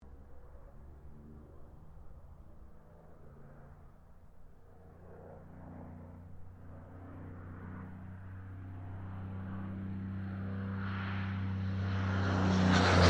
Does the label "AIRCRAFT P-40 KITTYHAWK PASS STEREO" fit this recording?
Yes